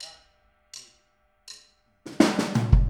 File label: Boogaloo - Kick, 82 BPM; Drums; Intro; Sonic Foundry; Drums from the Big Room The Mixes